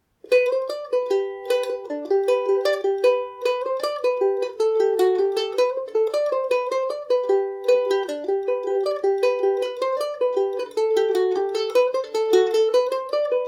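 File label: G major, irish mandolin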